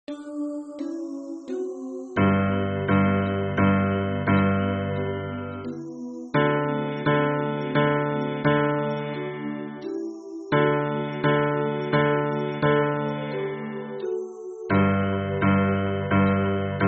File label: hymn bass